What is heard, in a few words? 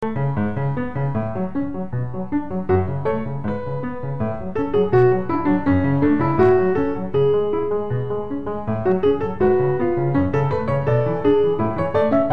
Passepied